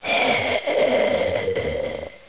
pain; svencoop; hunger; hgrunt

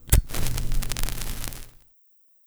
flint burn, TNT; weapons; svencoop; hunger

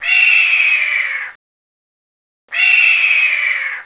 bird; svencoop; hunger; thambs

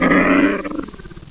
die; svencoop; voltigore

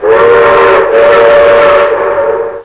train start; svencoop; hunger; hungerplats

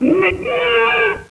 voltigore attack melee

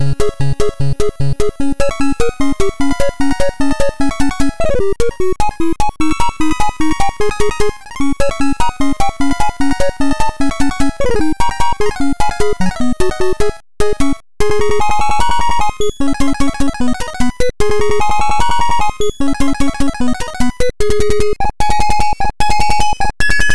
tetris music; svencoop; sc tetris

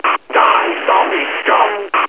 die; svencoop; hunger; hgrunt

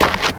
dirt; svencoop

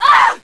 attack; svencoop; schall

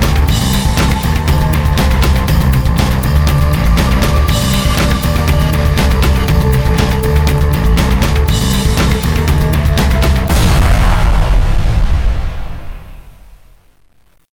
escape, music; svencoop; mommamesa

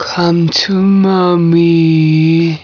zombie alert; hunger; hungerzombiefem

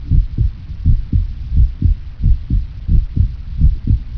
heart; magnus

